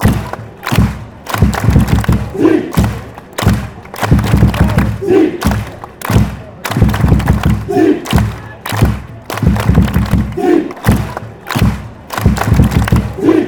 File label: victory fan chants